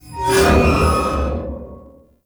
Weakpoint React Screech; Enemies; Gameplay; Lasrian Tank; Warframe

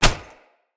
Unarmed Punch Impact Flesh Armour Hollow

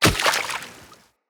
Kubrow Step Water, Footsteps

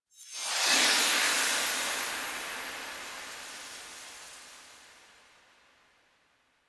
Intro Portal; Ballas Lotus Operator Intro; Warframe; The New War; Cinematics

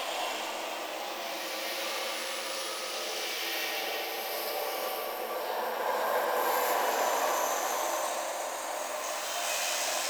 Landscape Ambient Texture Loop

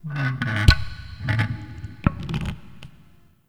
Terrarium Glass Stress

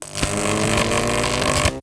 Lightning Strike Charge; Warframe; Teralyst; Rainalyst Abilities

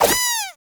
Shrink Baby Jump; Warframe; Nokko

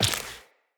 Step Flesh, Footsteps Walk; Characters; Tenno

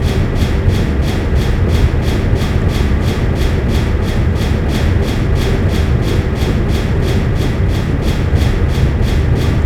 Large Spinning Pipe Loop; Warframe; Ambience; Grn Defense One

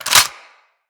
Weapon Jam Slide; Weapons; Grineer

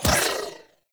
Dodge Impact; Warframe; Pacifist

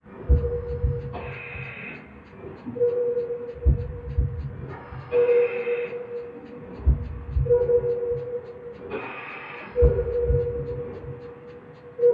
Medical Machine Buildup; Sacrifice Memory Four

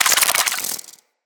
Infested Ashen Move Start

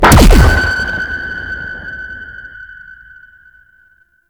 Bolt EMP; Warframe; Ranger

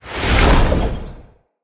Staff Caster Cast Heavy; Weapons; Staves; Dendrit Staff Caster

